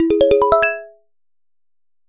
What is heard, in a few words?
correct